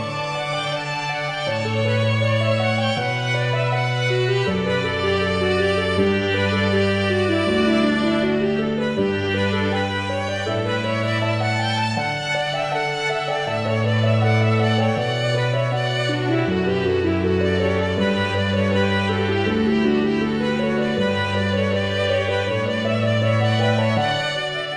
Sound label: canon in d